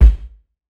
smooth bottom end kick A key, kick one shot tuned to the A note